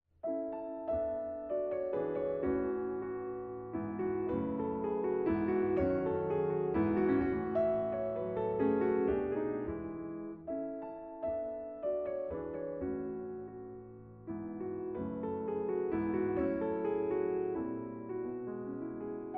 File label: minuet